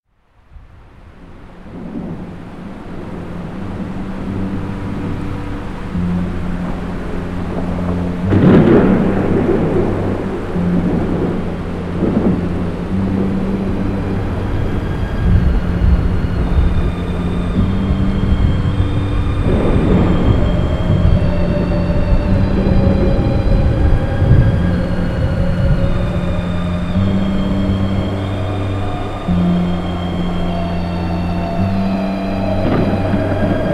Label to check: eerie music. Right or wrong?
Right